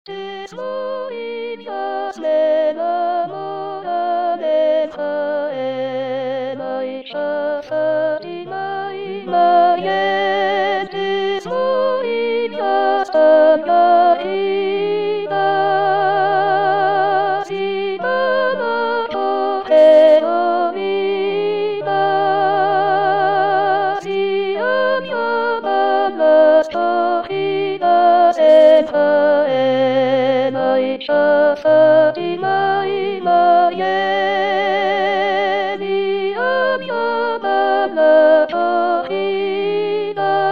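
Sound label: soprano; Tres Morillas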